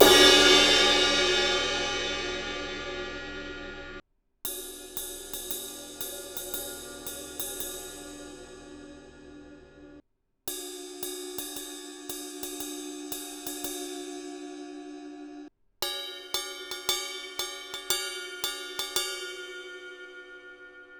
meinl 20 inch sound caster fusion powerful crash crash outer ride inner ride bell